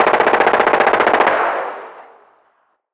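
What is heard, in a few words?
Weapon Rifle Laser Gatling Fire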